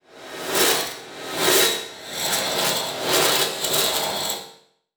Transition Whoosh Multiple; Production Element

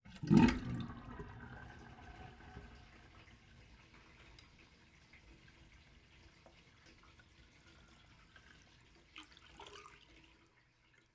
Liquid Sink In Bathroom Draining Water

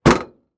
Saturn Close Trunk Exterior; Auto; Transportation

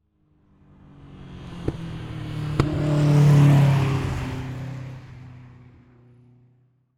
Transportation Car Race Car Drive By Turn Slow

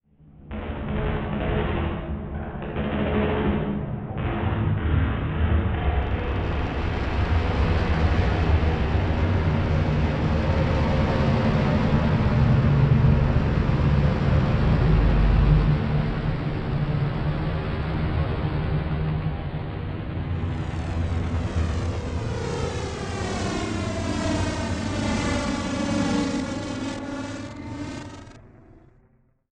Phasey Pitch Drop; Module Production Element Title Imaging; Imaging Elements

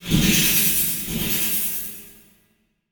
Transition Metallic Swell

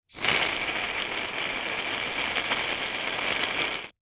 Shaving Cream Releasing Cream, Household

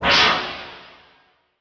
Transition Hit Impact Metal; Adobe; Production Element

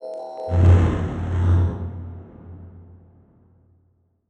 Title Transition Evolver Short; Production Element

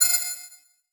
Multimedia Internet CD-Rom Flash Ding

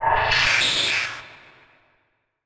Production Element Title Transition Bed

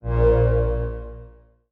Buttons Mechanical

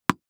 Sports Racquetball Ball Bounce

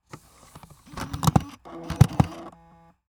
Technology Electronic Laptop Insert CD

